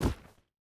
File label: footstep hide stones jump land; Rust